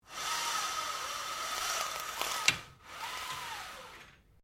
Blinds Venetian Raise Slowly, Household blinds; Living Room Sound Effects; Living Room (Sound Effects:Household)

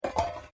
Bowl Metal Stacking; Various kitchen bowls; Metal (Sound Effects:Materials)

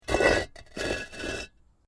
Brick Medium Hollow Move; Material components for constructing more complex sounds; Rock Sound Effects

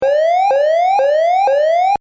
Electronic Alarm Loop; Alarms; Electronics; Stuart Duffield

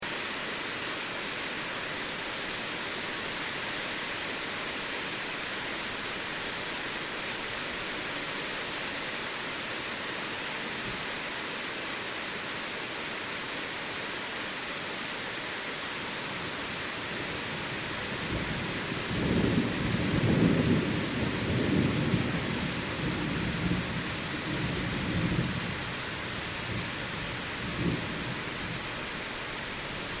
Storm Heavy Rain And Thunders; Weather

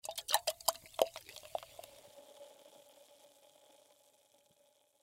Soda Can Pour, Soda Can Half Empty Shake Fizz; Shaking; Foley; Objects; Blastwave

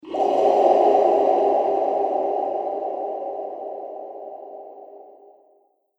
Drip Reverb Whoosh; Whoosh Sound Effects

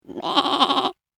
Sheep - Animal Impersonation; Sheep Sound Effects

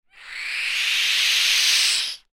Insects Spider, Insects Spider Scream Hiss; Animal; Insect Sound Effects

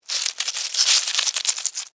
Insect Move Wet, Bug; Animal, Insect, Movement, Wet, Bug; Insect Movement Sound Effects

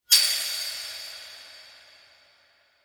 Horror Scrape Metal, Dark; Metallic; Scary Soundscape Sound Effects; BlastWave

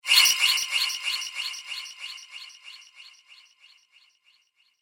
Bright Laser Shaker

